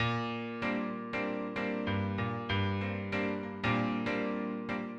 PIANO 96 G; old school

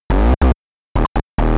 TECHNO BASS 140 A

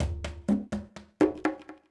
PERCUSSN ETHNO 125, Drum

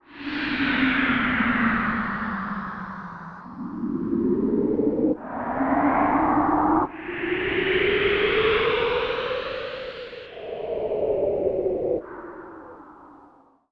SPACE SHIPS; SpaceSounds

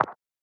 One Shot, Hits

